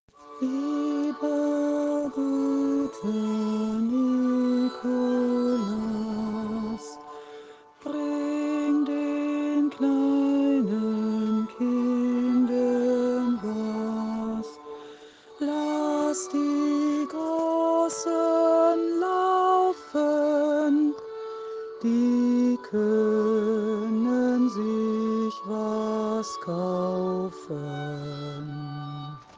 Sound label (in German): Lieber Guter Nikolaus Gesang Stimme